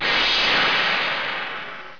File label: TELEPORT; robots